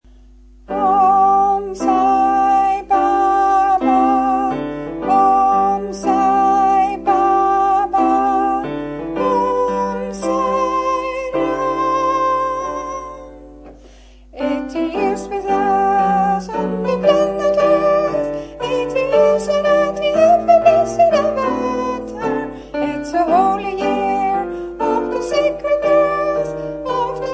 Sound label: Soprano; 80 Years